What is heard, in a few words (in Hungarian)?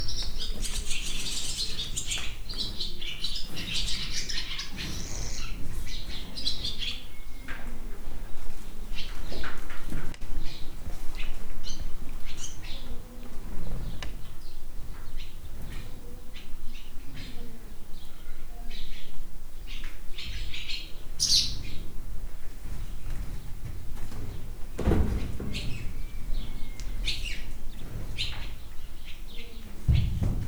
fecskék a lóistállóban; parasztudvar